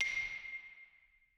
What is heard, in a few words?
xylophone C7; Perc; M8; BBC